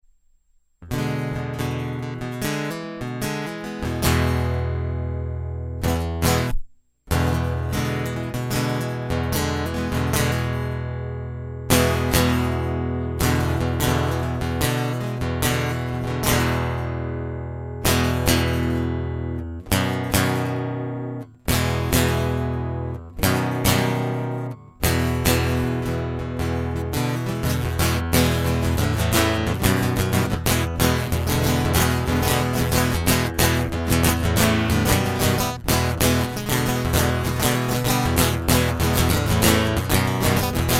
Guitar Loop

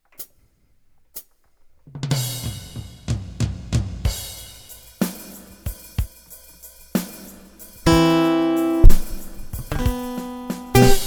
An Air Postlude; hyper terrestrial

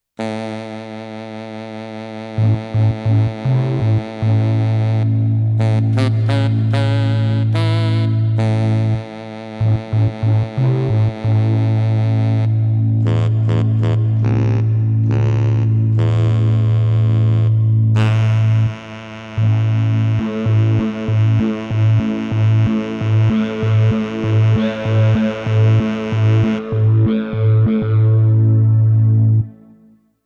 Instrumental Interplay

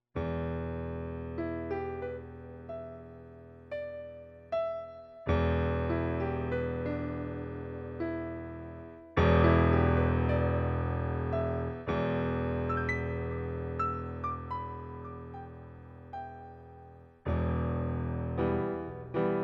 Piano Interlude; hyper terrestrial

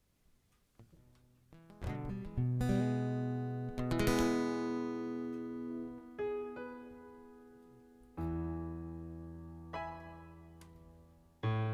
Blues

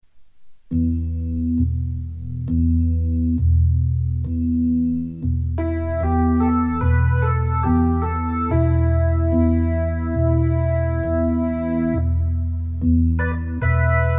Organ Em; hyper terrestrial; A Mute Mutiny

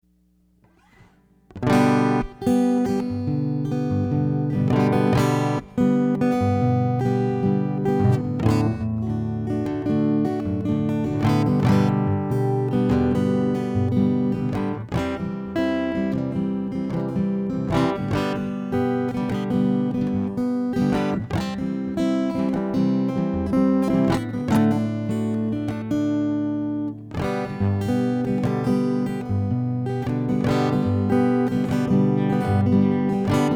Instrumental; hyper terrestrial

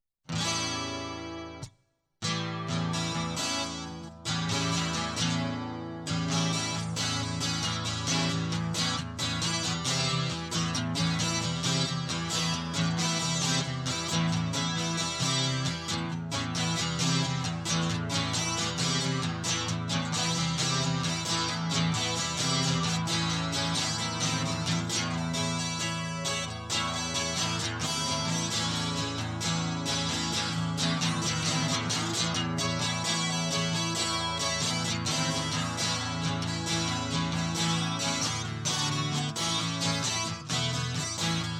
Guitar Loop

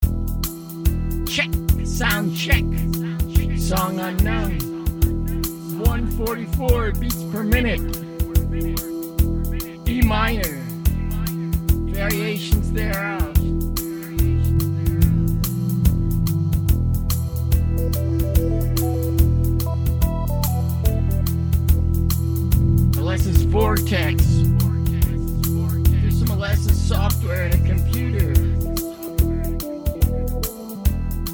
Ambient Ambience Soundcheck

